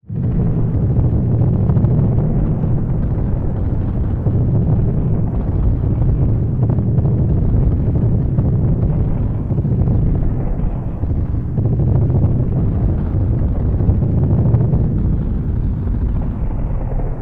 Dark Rumbling Ambience

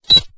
Single UI Beep

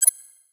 UI Double Beep

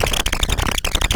Loopable Mechanical Malfunction Rumble

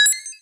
Arpeggiated UI Beep

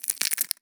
Small Dry Crackle

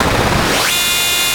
Machine Jet Buzz

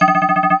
Multiple UI Beeps

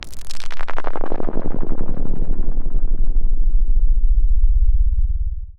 Distant Sci-fi Rumble

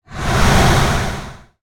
Swoosh Transition

